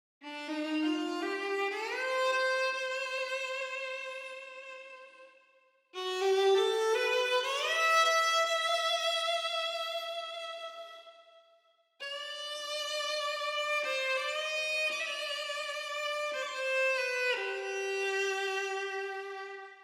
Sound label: Violin Melancholy 84